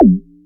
Option Change; A Robot Named Fight

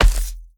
Footsteps Dirt Run; The Town

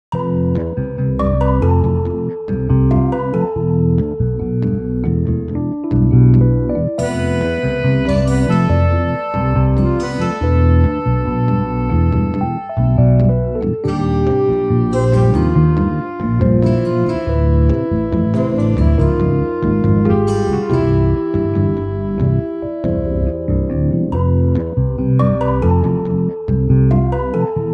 New Wave Bossa Nova; the legend of zelda majoras mask reborn 2023

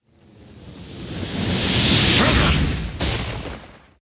Wizard Attack; Puzzle Chronicles; PS3; PSP; X360

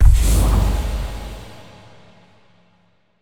shadow grenade explode

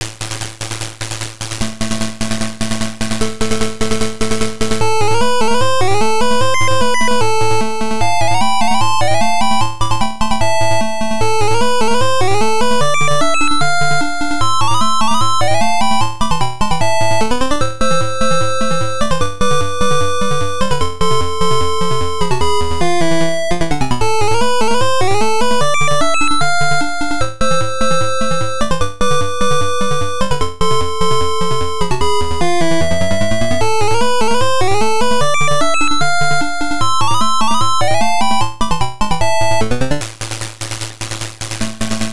Main BGM; Pirate Pete; Jungle King; Taito; SJ System; arcade

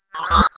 spooky

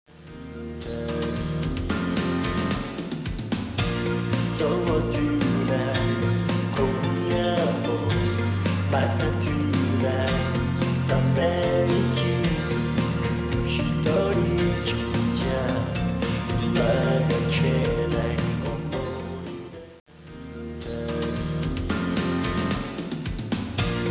karaoke bgm; yakuza ryu ga gotoku kiwami 2 pc gamerip

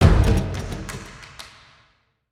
Siege Field Drums; Ninjago Monastery; lego universe macos windows gamerip 2010

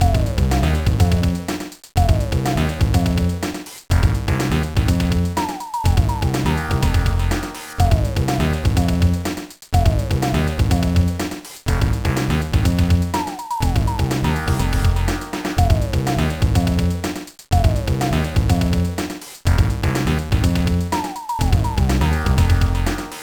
BGM; Razor Freestyle Scooter; GBA

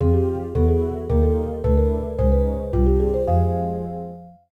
Jingle Game Intro

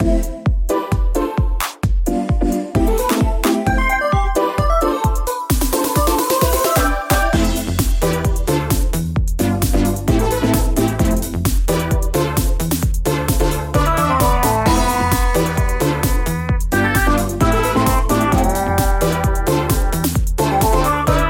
Futuristic Type Bass; ooblets

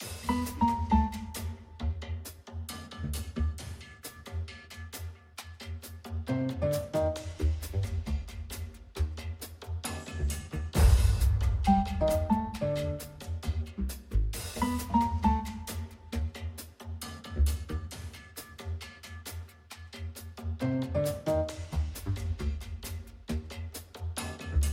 Suspense; Hello Neighbor 2; Mayor

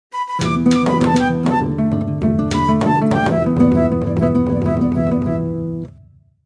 Aztec Music; Civilization Revolution

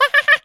Monkey Idle; Angry Birds Rio

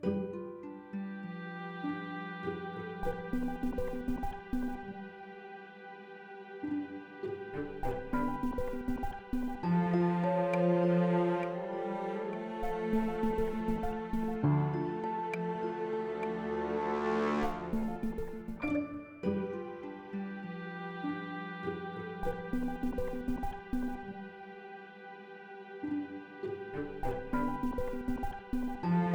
Quest Melody Loop; bakugan champions of vestroia 2020; switch gamerip

